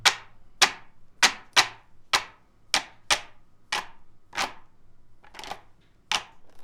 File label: bandoneon